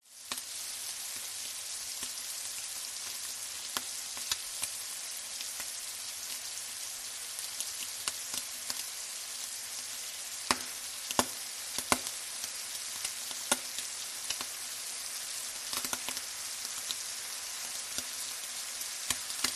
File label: liquid water sizzle spit boil in shallow flat metal pan; zapsplat